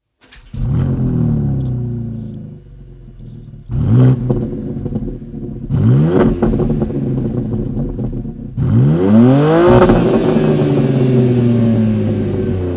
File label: STOCK EXHAUST BMW M4 OPF; M3 G80 G81 OPF GPF 2023